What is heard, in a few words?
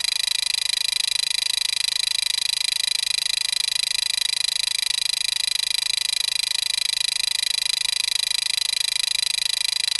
camera focus lock sound